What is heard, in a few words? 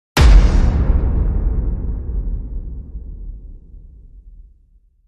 Hit